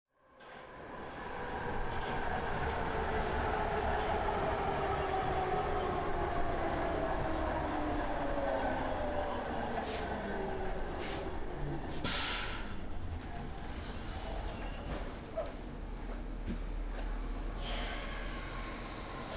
Ubahn; Roland R26

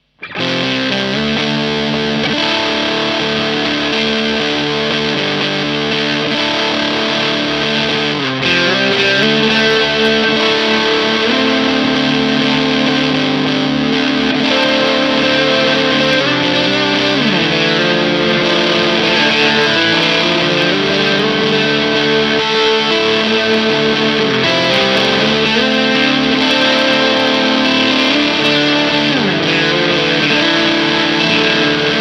Ibanez center zerre

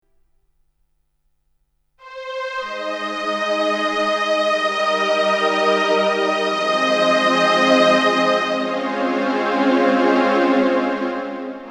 CLP575 Streicher

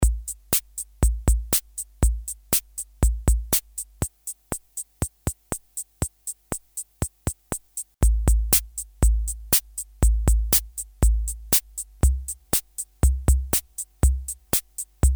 Roland CR-78 mit modifizierter BD und Snare

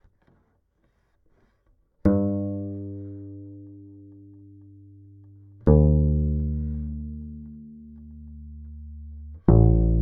Phil Jones Kontrabass Alle Regler

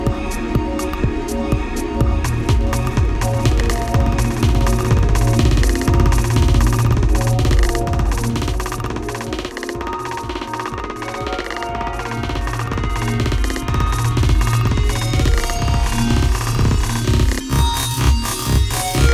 Multitap Delay mit Isolator

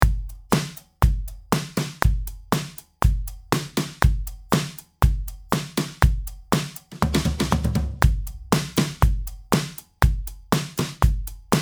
Logic ProX freier Drumbeat mit Flex angepasst

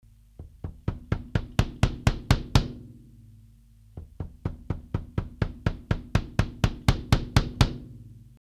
18 x 16 Kick dynamisch; Kirchhoff Test

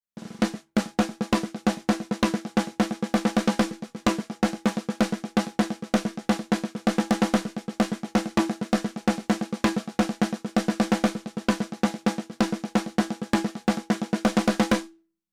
SD, 100 Prozent Gain, 3 zu 1; API 512v

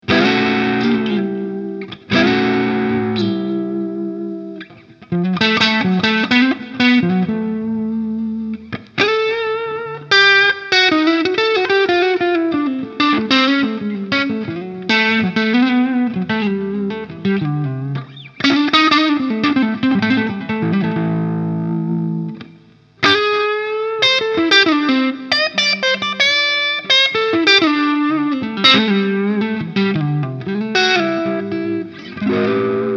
overhive gain 12.00 biene und wabe unten Hals

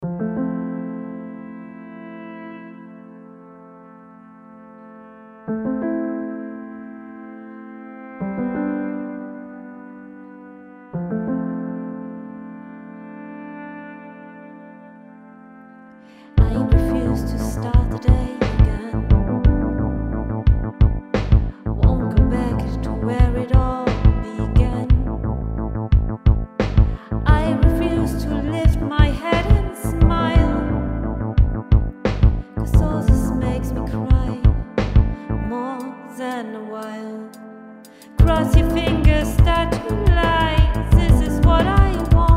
Deluge Sound mit Gesang; Cross your fingers

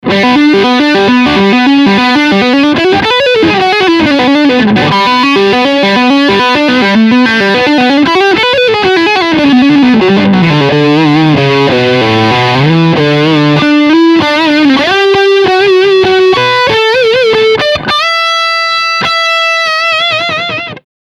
Overdrive Lick Steg HB

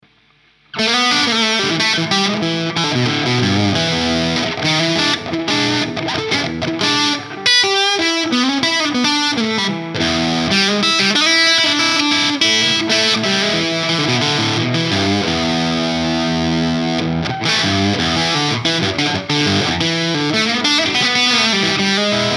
steg zerre

